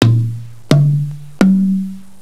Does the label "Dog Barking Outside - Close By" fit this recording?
No